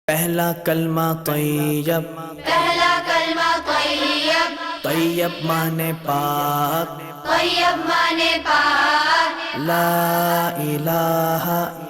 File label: Pehla Kalma Tayyab Arabic; ARY Qtv